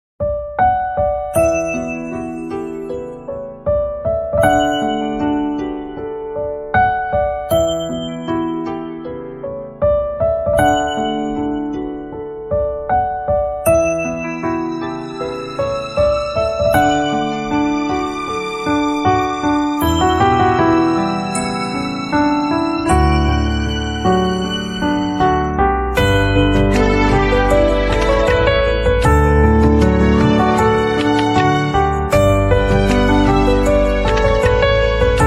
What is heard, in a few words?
Piano